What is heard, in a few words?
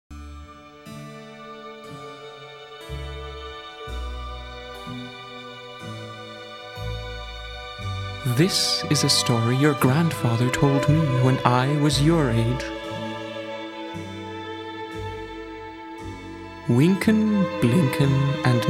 Bells children